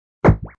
Zap; Zaps; Electronic

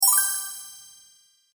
Fairy Sparkle, magic; Magic Sound Effects

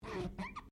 Glass Squeak Rubber; Material components for constructing more complex sounds; Materials

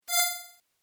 Tech rollover, Rollover: Short, High Tech Effect; Mouseovers (Sound Effects:Multimedia)

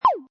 Retro Shooter, Button sound or menu sound; Buttons (Sound Effects:Multimedia)

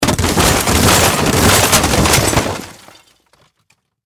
Impact Crash Heavy; Crashes

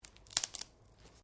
Branches Dead Strain; Material components for constructing more complex sounds; Breaking (Sound Effects:Objects)

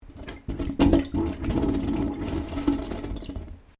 Water Gurgling In Pipe; Weather

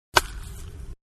Baseball Softball Wood bat hitting Baseball

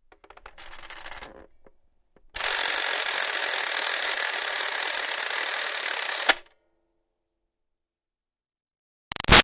Toy Toaster Countdown Timer Tick; Plastic Toaster; Wind Up Toys

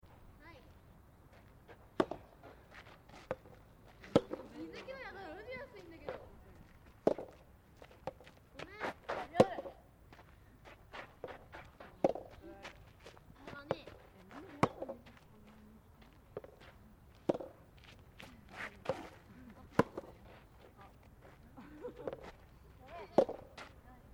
AMBIENCE STUDENTS PLAYING TENNIS, Japanese; Japanese "soft" tennis; Sannohe; Sports

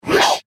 Whoosh Fast Wipe; BlastWave

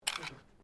PISTOL TANFOGLIO 38 SUPER MAGAZINE UNLOAD; Handguns, non military; Pistols

